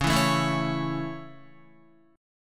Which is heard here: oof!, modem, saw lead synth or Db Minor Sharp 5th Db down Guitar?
Db Minor Sharp 5th Db down Guitar